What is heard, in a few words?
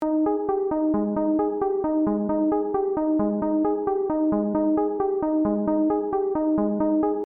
Pluck; PatchFactory